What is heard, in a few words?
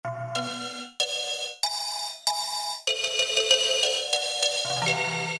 Xylo Gate, Roland PN-D50-02